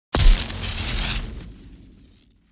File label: Electrical energy Impact explosion burst; shockwave